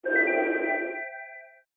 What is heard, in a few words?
Revive heal